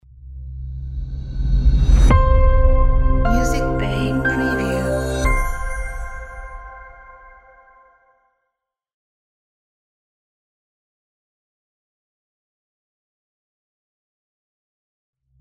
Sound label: Minimalistic Clean Logo